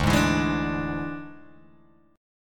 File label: D Suspended 2nd Flat 5th D Guitar Open G